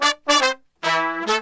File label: BR 110, BRASS MURGA; Best Service ProSamples vol.14; World Grooves; AKAI